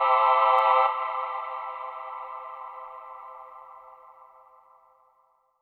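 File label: synth electro 140 A; Techno; Keys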